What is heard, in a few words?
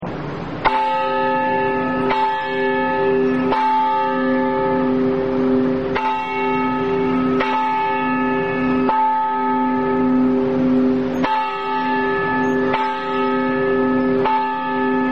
bell whistle, steamboats